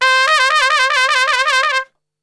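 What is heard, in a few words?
C trumpet shake; Best Service; Brass Super Section; TRP SHAKE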